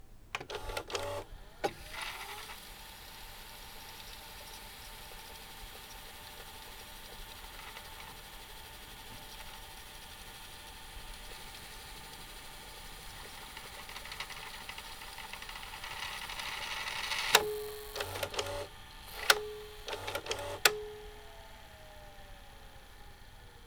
Brodcast VTR Tape FF, VHS; SFX; IRL Recorded

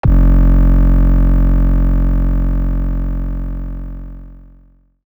Clean Bass; 808

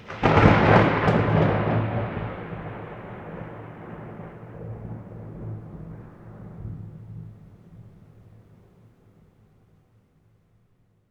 Thunder

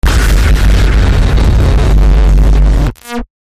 Nuclear Explosion; SFX

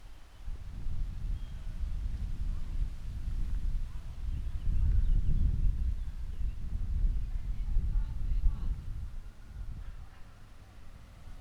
Light Wind Blowing; SFX; IRL Recorded

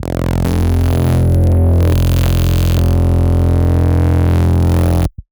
Reese, Impacts Bass; SFX

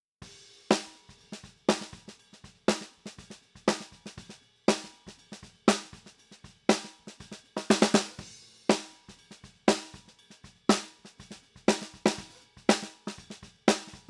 Snare Bot; Reaper project

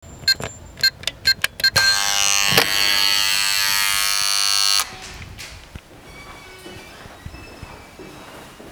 Door Access; SFX; IRL Recorded

